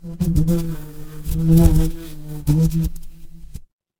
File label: animal insect